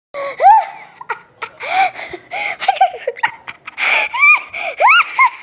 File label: nonstop laughing